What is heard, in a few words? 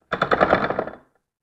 Castle Siege Drawbridge; Miscellaneous Door Sound Effects; fantasy sound effect; Andrew Fleming